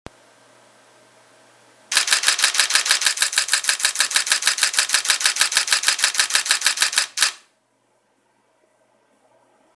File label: normal burst, Nikon D750